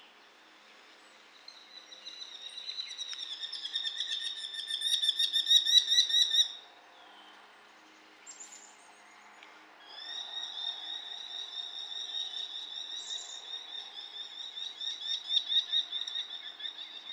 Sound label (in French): Faucon emerillon Falco columbarius columbarius, chants des oiseaux